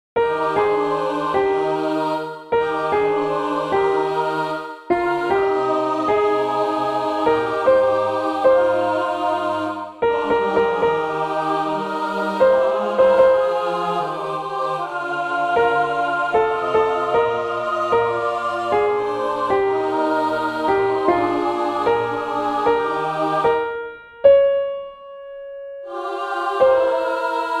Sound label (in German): Tenor Klavier; As torrents in summer; Edward Elgar